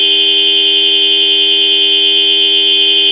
horn close; favela; merc; Call of Duty Modern Warfare

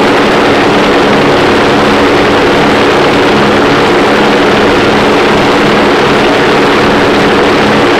engine bomber mid; engines; War Thunder

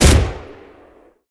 mg 13mm dshk lastshot, guns tanks; War Thunder

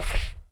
wood slip

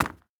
stag footstep wood hard; Rust

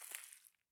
walk grass low; Escape from Tarkov

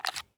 mp7 mag out; Escape from Tarkov

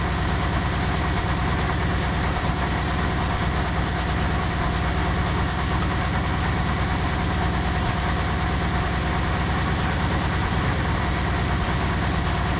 m1 engine rpm 66, tanks; War Thunder

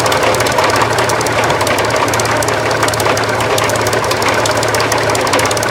engine stop additional; War Thunder

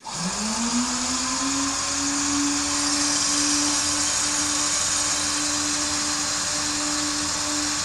P-51D Cockpit Actuator Coolant; DCS

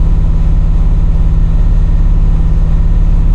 valentine engine int rpm 33 load, tanks; cannons; War Thunder

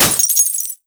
glass

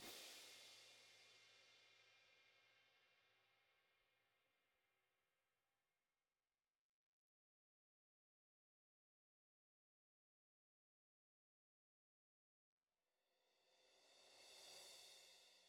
music interactive; World of Tanks

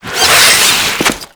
rappel push off

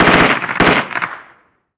gun mp5 med

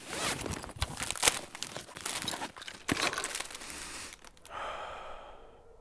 bandage use; Escape from Tarkov

